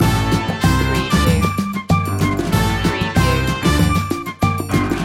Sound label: loop, latin spanish; world influences